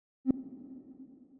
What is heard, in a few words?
hover